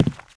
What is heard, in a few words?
pl tile; tfc